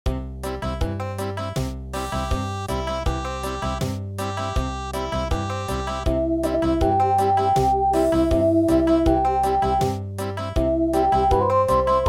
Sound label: Wiegenlied, Country; midi; traditional